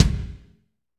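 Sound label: kick, Kit Wet; Northstar - Drumscapes Roland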